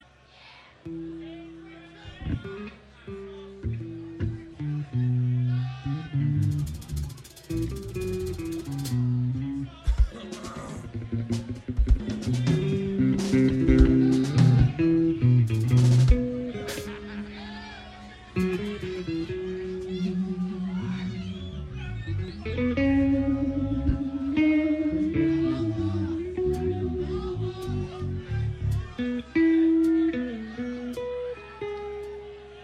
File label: Tuning